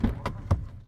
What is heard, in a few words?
car door open; vehicles; NS Studios - ultra sound collection